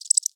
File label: Insect Cricket Chirp Single; Frogsong